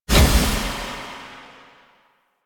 Guardian Shell Break; Warframe; Focus; Vazarin

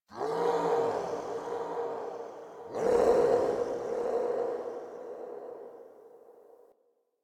Chatter Distant Growl Moan, Ambience; Warframe; Infested Eris; Outdoor

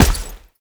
Heavy Step, Footsteps; Enemies; Foley; Warframe; Tau Dax

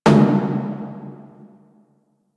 Taiko Drum, Dojo Props

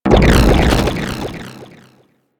Sanctuary Target Goo; Warframe

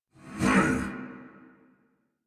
Attack Effort; Warframe; Dax Heavy; en

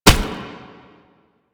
Proto Infested Brachiolyst Step C; Enemies; Steps; Warframe

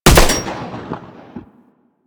Fire Mech, Weapons; Strun

